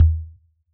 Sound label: Analog Tom, Yamaha DJX; 155 DJX Kit